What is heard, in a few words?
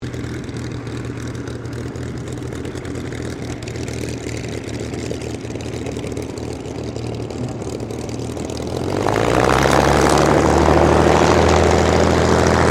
AIRCRAFT SUPERMARINE SPITFIRE MK-XVI IDLE SHUTDOWN STEREO; Airplane Sound Effects